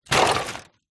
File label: transport bicycle drop impact on wheels